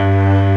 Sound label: Jingle loop; SNES; Romancing SaGa 2